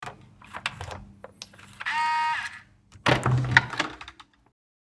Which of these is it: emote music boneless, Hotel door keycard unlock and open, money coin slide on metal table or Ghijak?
Hotel door keycard unlock and open